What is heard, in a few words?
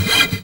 GUI Ac. Fx, GUIT AC; Best Service Gigapack 2